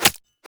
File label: Sword Skin Cut And Stab